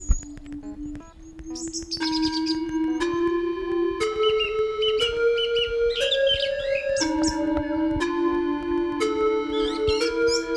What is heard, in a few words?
Huia birds; Synthasis